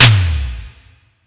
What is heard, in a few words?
Tom Electro, Yamaha PSR E305